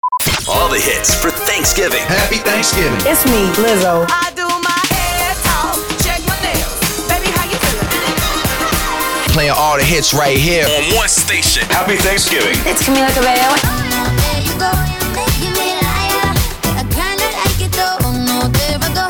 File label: sweeper beatmix